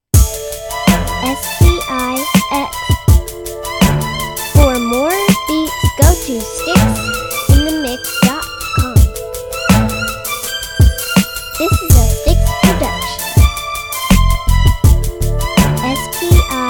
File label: string bird